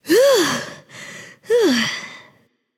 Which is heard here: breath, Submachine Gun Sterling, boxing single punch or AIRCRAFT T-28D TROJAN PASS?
breath